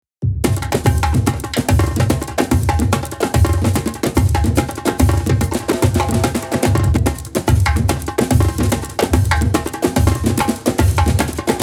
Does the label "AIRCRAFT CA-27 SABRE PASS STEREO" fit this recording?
No